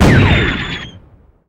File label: Cannon Fire Whistle; Weapons